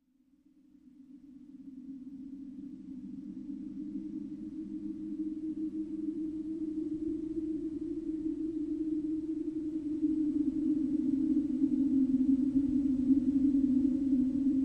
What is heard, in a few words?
Spirit Air Spiral, ghosts